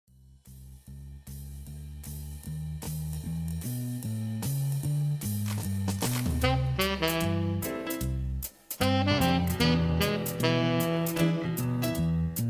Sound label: sax tenor